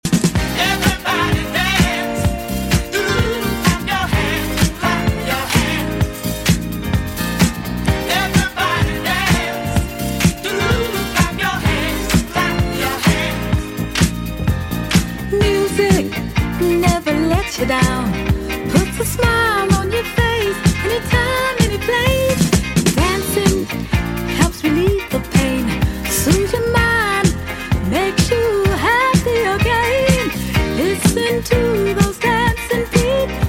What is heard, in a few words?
Vocal